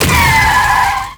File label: Bike Damage; Rusalka; Warframe; NineteenNinetyNine; Stage8ShitboxConvoy